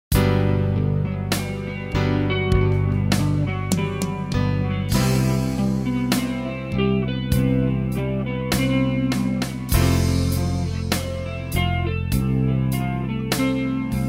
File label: Time machine, Efectos Biyang